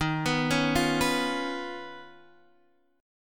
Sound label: D sharp 7th Suspended 2nd Sharp 5th, Guitar, Standard; arpeggiated; x,6,9,6,6,7; D#7sus2#5; JGuitar